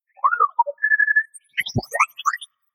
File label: SciFi Robot Chatter Computer Button Digital Hollow Space; Sound Design; Tom Hutchings